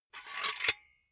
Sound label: silicone gun empty pusher push fast; soundpool